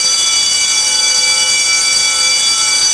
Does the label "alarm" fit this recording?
Yes